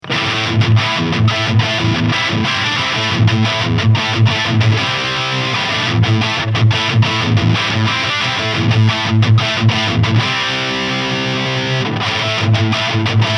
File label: Heavy Crunch; Solar Guitars E1.6AAN